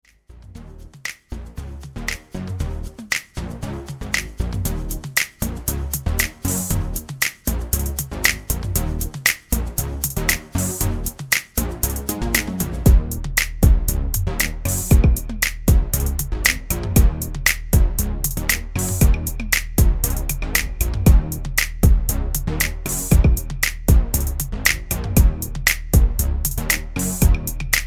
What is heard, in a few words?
INST 117BPM